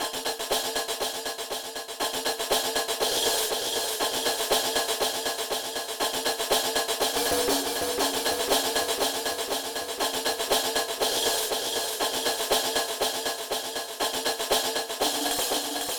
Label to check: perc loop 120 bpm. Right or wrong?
Right